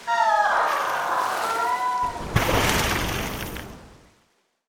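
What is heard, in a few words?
elemental death, monster; lake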